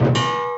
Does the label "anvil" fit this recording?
Yes